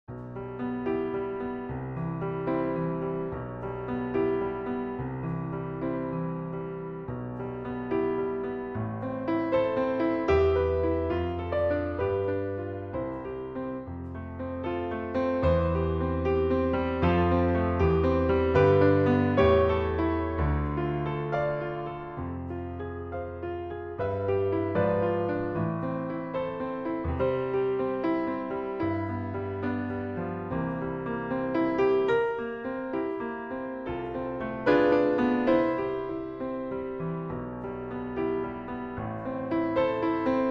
Piano; Strauss Richard; Zueignung

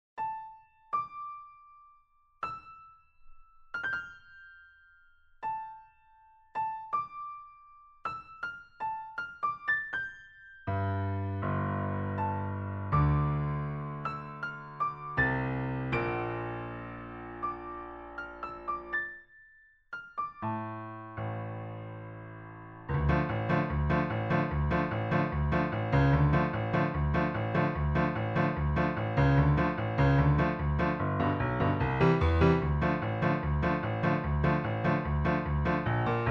Secondo Fast

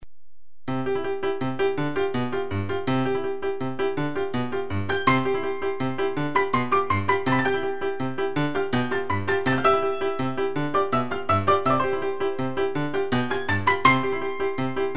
Paso doble 164

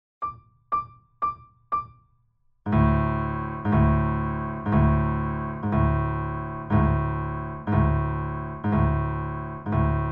Halling LH T120; Grieg; Lyrische Stuecke Op47

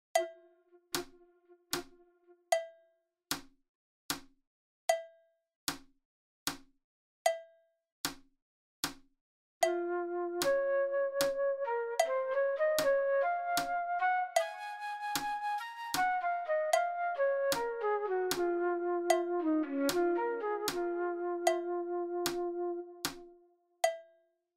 flute fast; Uebungs-CDs; Playbacks

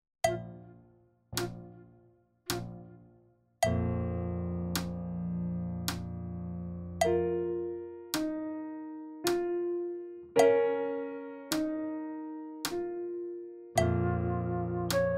Piano Flute Medium; Uebungs-CDs; Playbacks

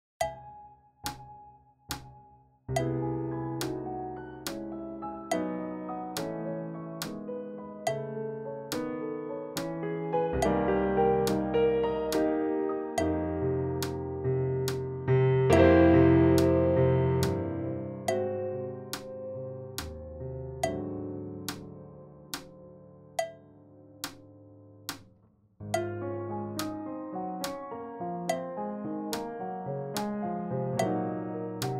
piano fast; Uebungs-CDs; Playbacks

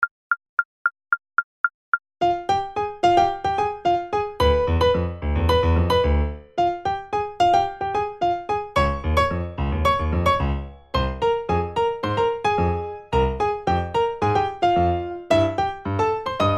Charleston 110 Primo Secondo; Seiber

